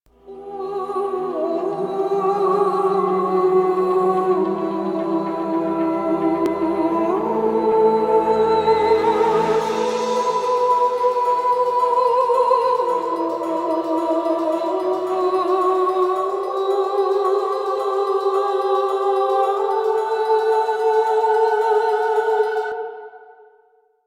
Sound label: Death Loop; TennoCon2022